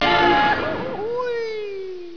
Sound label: yell; goofy; templetoon